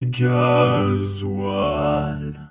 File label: fuel fill up; gmod; svmod